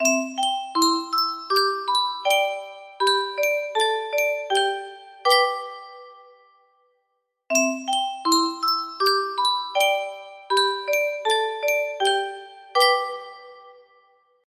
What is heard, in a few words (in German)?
Yunsheng Spieluhr